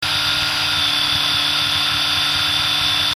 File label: oem 4 5k, cans